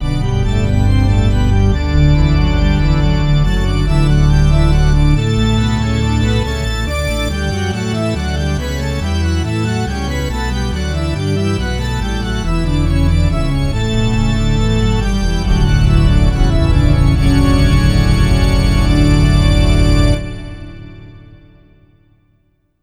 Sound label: Church Organ; lost kingdoms; gamecube; Grenfoel